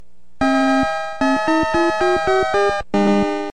sms; GhostnGoblins

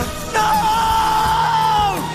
screaming; shulk

